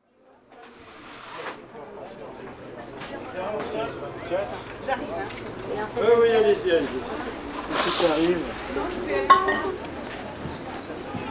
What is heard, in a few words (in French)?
Le marche